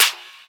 Clap; Drums; Claps; Best Service; House Definition VOL-1

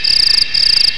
ANIML; Zero G; Man Machine

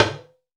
talking drum; AKAI S-Series CD-ROM Sound Library VOL-5

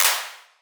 Clap, Drum Percussion Single Hits

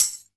PERC, Drum & Bass, 140 BPM; Ueberschall; Drum & Bass Resonance

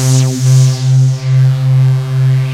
FLYING ATCK, synths; Pro-Rec - Synthsonic

